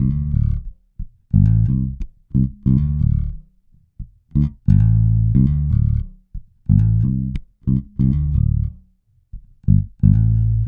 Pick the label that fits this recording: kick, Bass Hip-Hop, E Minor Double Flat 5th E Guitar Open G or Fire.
Bass Hip-Hop